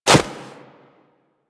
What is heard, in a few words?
Operator Run Snow, Footsteps; Warframe